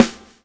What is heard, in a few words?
SWNG